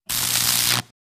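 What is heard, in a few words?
Electric Buzz Sizzle; buzz & hums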